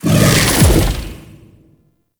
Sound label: Howl End; Warframe; Voruna